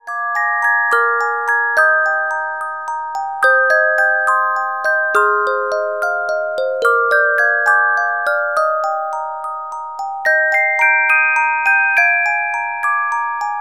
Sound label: Carillon Music Box Melodies